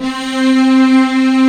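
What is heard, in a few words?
D70 warm strings; East West; Global Sounds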